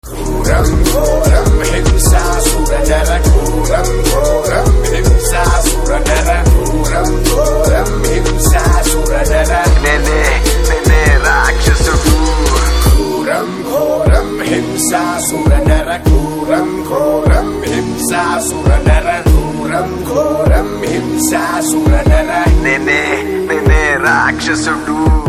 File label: Theme Ringtone; Rakshasudu